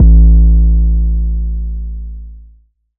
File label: 808 A; Drums; One Shots; 808s; Cymatics; Cymatics - 9God Hip Hop Sample Pack